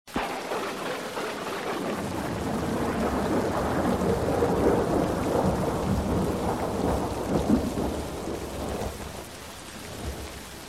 Thunder Nature

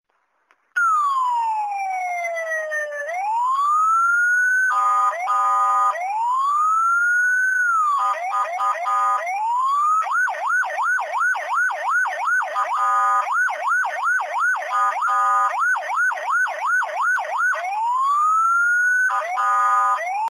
Sirene; Inem

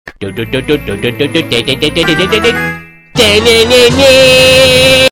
Human Imitation

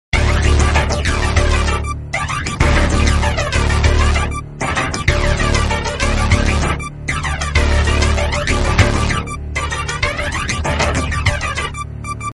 Vehicle Music

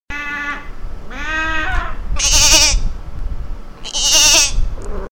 Goat

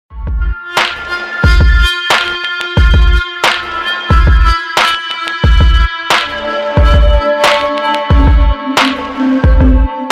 Little Ambient

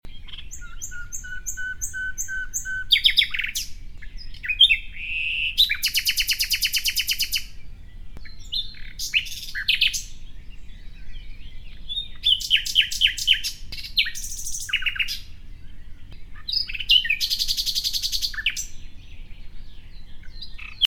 Great Voice Birds

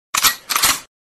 Load Gun

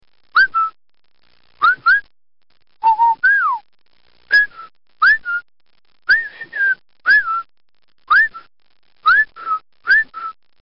Whistle

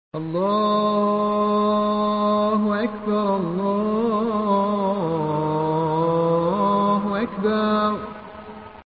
the azan

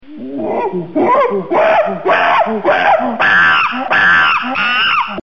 Angry Chimpanzee

